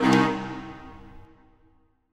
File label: music puzzle note up